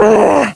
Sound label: Gore Pain, Ginger